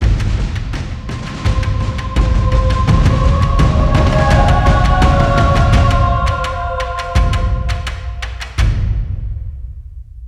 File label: Stinger Encounter Siege Complete, Music; Stingers